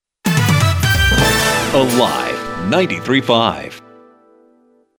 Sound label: Weather Out